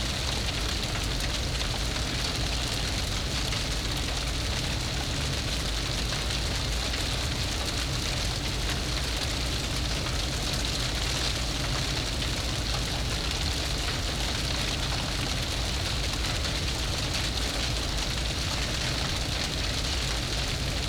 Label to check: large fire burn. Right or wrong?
Right